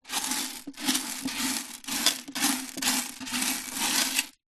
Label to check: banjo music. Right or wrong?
Wrong